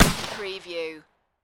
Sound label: Shot Gun Mossburg 500 12 Gauge Single Shot Designed; Gun sound effects; gun shots; Gun sound effects, bullets, gun shots, pistol sounds, rifles, machine gun sounds